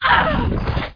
Monster

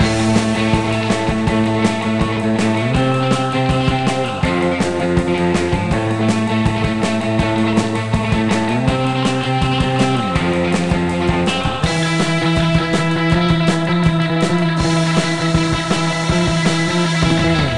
Music Beach

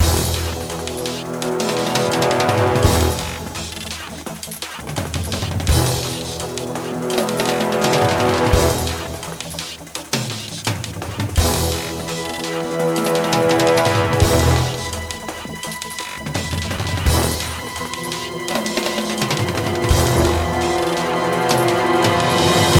Track Music Action; Emergency Disaster Rescue Squad; NDS

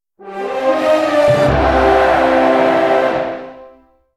Event Win; The Great Whale Road

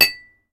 Impact glass hard

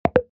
Boopie off

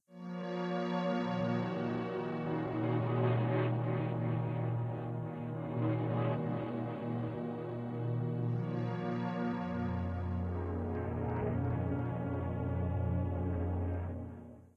Synth Ambience; gamerip; door to door

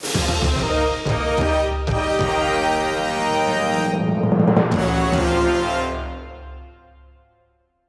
Jingle Mid Boss Defeated; Mario vs. Donkey Kong

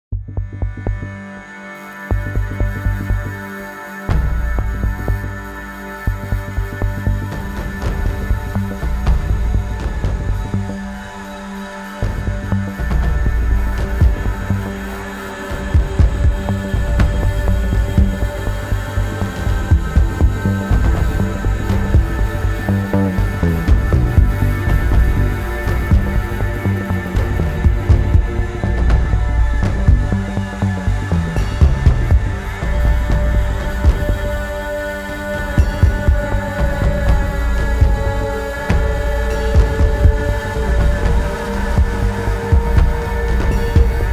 Death Knell; Shadow Gambit The Cursed Crew original game soundtrack 2023

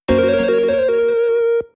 Item Obtained; Cathedral

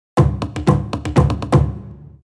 Race Start Fanfare; Yoshi's Island

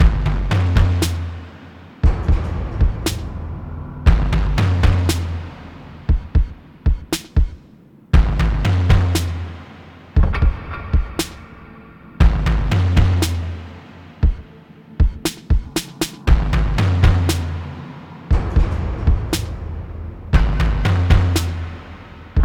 Menu Loop; Pix the Cat

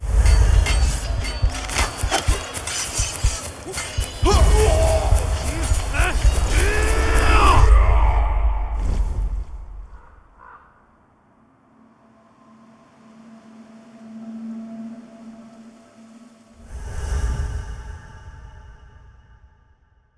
flashback; Aragami

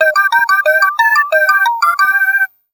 Jingle; Elevator Action Deluxe; PS3

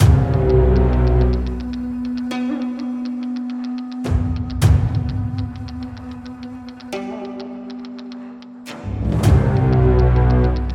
combat normal II level 3

